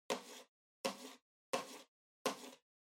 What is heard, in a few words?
PC-Mouse-Move (Far-Wood-Hit)